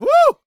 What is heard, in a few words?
shouts, gospel preacher